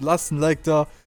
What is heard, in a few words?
death; spackenmobs; apored